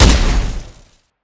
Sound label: shoot; EMXArms; emx mg90